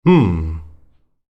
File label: "hmm"; هوم